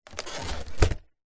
household basket picnic hamper lid close creak

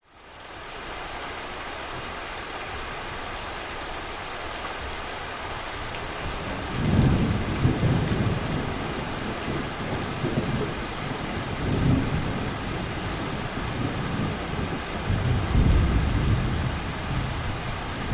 thunderstorm bali rain heavy

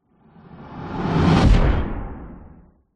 sound design whoosh heavy hard fast impact

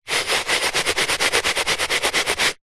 brush small scrub carpet; household

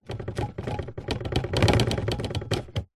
household drinking glasses in cardboard box shake; zapsplat

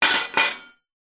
household baking tray metal impact drop

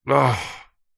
human male grumpy angry frustrated sigh; zapsplat

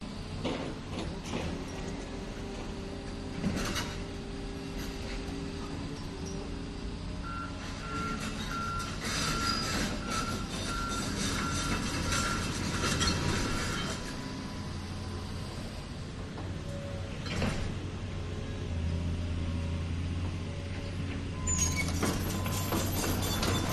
excavators contruction site moving digging; your free sounds

